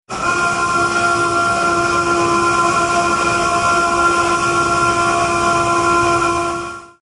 Warship Navy Military War Ship Alarm

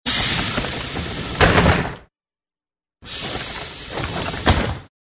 bus door open and close; transport

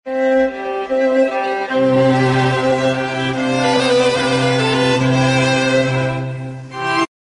mozart nachtmuzik romanza strings

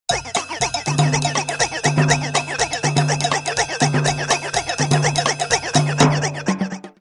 Rap Alert

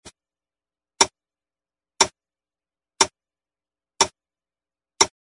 clocks tick tocks heavy

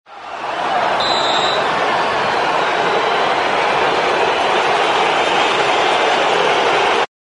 soccer red card call; sports

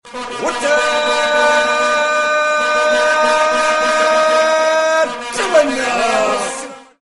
Vuvuzela

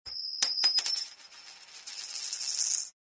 coin drop on concrete

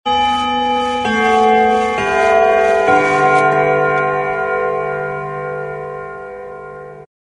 grandfather clock quarter hour chime

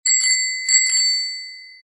bells bell bicycle double ring

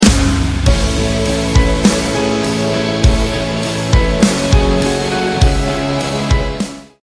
night (instrumental)

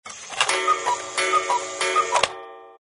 cuckoo clock strikes three

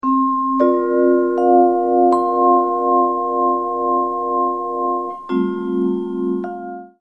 Deluxe Iphone Alert

